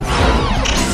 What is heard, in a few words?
robot small movement